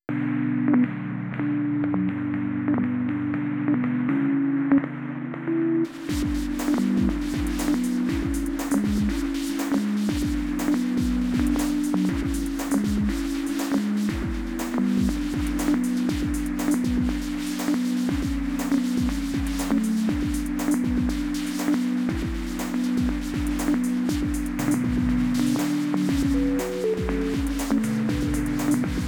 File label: chordbased drums schneller; omnisphere